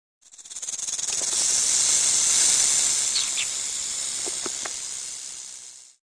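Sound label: ambience snake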